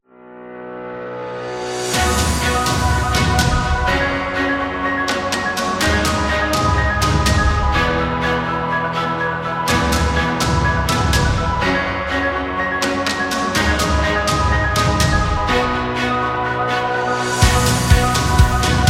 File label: Epic Motivation